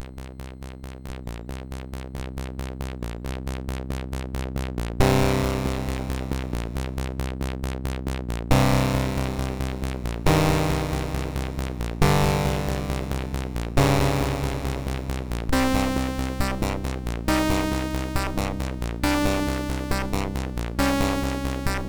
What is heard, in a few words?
sid MOS 6581R4; Aliens